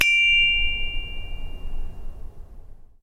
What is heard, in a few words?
chinese bronze bell front; sackler